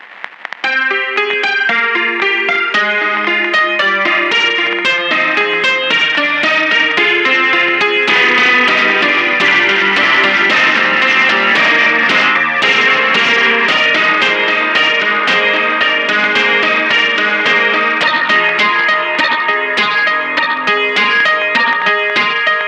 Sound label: Ragtime; IK Multimedia; SampleTank2.5XL